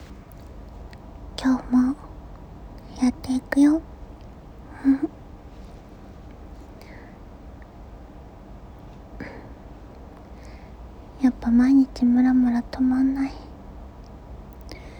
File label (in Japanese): 現役JDおなにー; 現役JD実演オナニーボイス。〜演技なしオナニー詰め合わせ〜